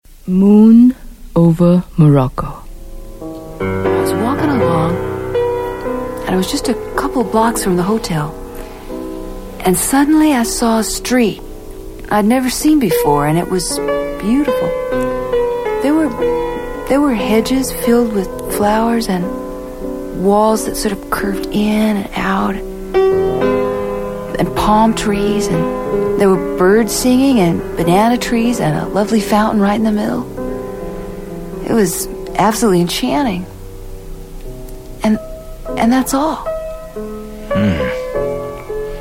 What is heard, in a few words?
Promo Sunny Piano